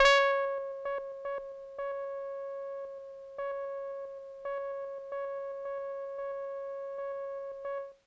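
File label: Kawaii C#4; keys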